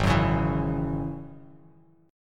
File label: Ab 7th Suspended 4th Sharp 5th down Bass Standard; Ab7sus4#5; strummed; chordshape; JGuitar